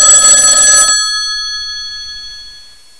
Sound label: ring